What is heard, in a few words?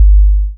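THUNDER DRUM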